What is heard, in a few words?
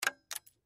Paper Clip Drop; Drops; Office; Blastwave